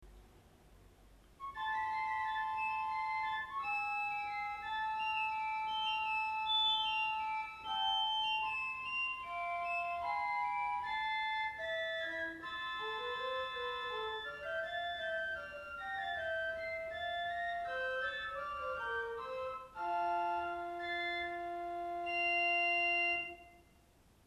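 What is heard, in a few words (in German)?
Waldflöte 2, Orgelstimmen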